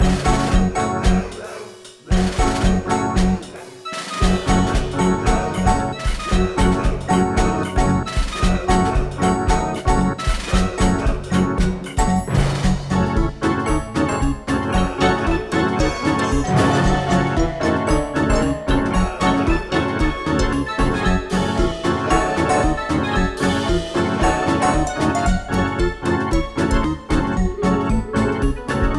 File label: Zombie Carnival; Rush2 Zombie3